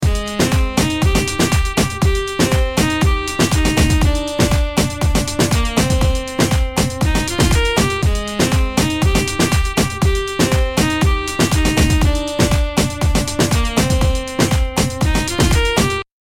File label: saxo dance; jazz